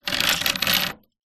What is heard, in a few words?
industrial chain metal medium move over wood rattle